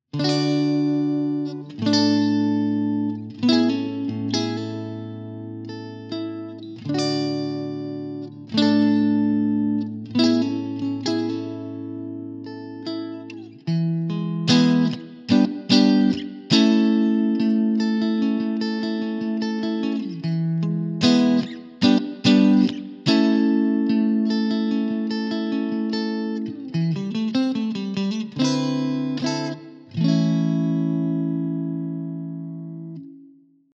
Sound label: clean; jensentone